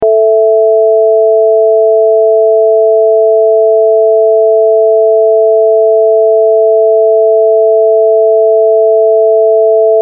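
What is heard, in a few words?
Pythagorean pure fifth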